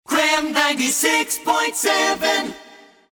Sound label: jingle